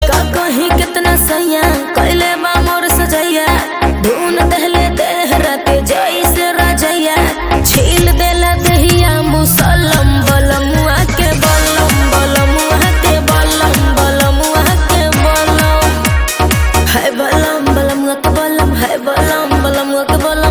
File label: Ringtone